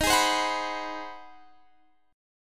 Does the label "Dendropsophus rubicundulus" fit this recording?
No